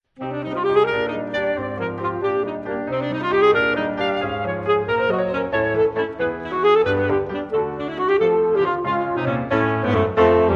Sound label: Sax Sonata; Sonata for Alto Saxophone and Piano